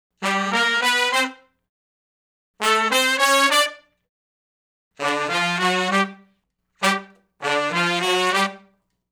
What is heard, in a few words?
R&B Riff IV, V, I (G) unison, Horncraft for R&B; Sections; Sonic Foundry (Sony Creative Software)